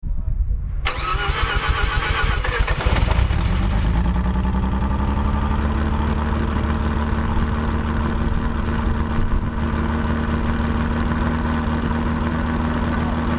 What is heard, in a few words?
bridgeported rx7 sport sound